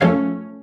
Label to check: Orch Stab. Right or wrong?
Right